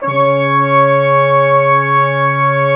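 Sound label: BRS QUINT, brass quintet long; Roland L-CDX-03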